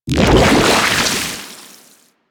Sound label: Liquify; Warframe; Warframes; Pirate